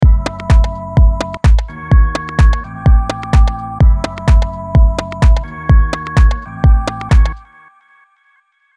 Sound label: drums plus organ